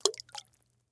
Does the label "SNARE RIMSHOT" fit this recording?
No